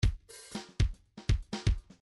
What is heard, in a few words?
midi grooves funk